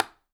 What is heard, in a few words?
Palette Pulse, Wooden Palette